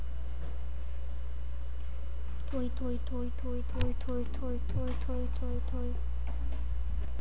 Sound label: toy